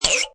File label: Cartoon Musical Zip, Funny ratchet or zip comedy sound; Comedy/Cartoon